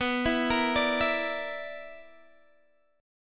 B Minor Major 7th Double Flat 5th B, Guitar, Open D